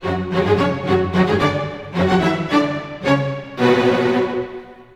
110 strings, F#min, Orchestral arrangements; PowerFX - Downtown Orchestra

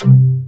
VOCODER DRUM

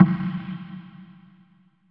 hit noise; AKAI S6000 CD-ROM - Volume 5

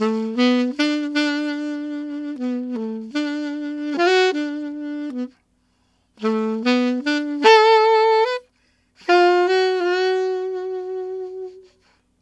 Alto Rock Ballad in D maj, Sax; Sonic Foundry (Sony Creative Software)

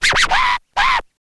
scratch kit, vinyl

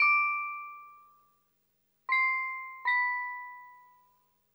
BEL, Hip Hop and R&B Drumloops; Best Service; ProSamples vol.01; AKAI

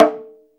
CONGA S CL, Cuba; AKAI S6000 CD-ROM - Volume 5

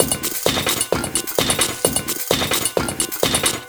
Beat (130BPM), household rhythm

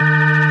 B3 E 2, ORGAN; VOCAL ORGAN; ORGAN #4

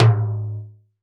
Timbal Lo Short; Percussion

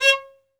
Synclavier Strings, SOLO VI; ILIO

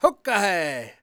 powwow, World Voices, Lakota

